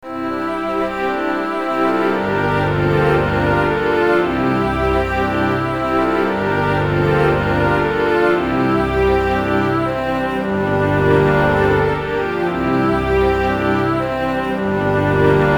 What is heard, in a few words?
low cost sit strings; soundtracks